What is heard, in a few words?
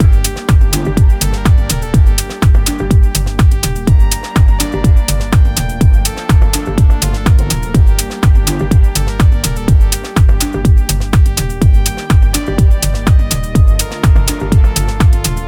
124BPM Gmin